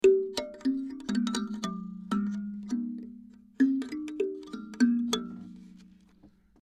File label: kalimba